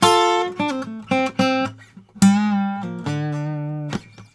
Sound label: Blues Guitar; ILIO; Fretworks